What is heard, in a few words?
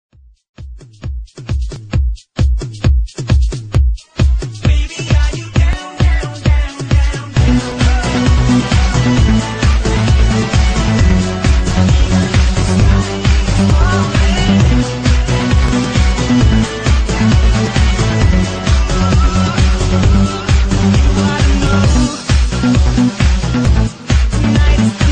techno remix ringtone; iphone 6s